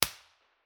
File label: koli summer, national park; 4 way mono processed